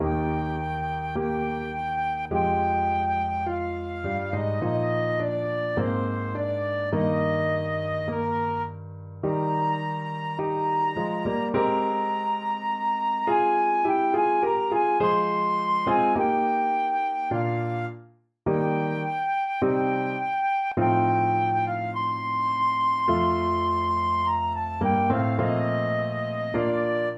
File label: Beethoven op 30 II flute